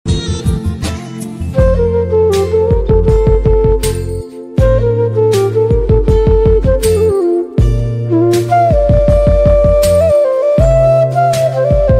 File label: flute ringtone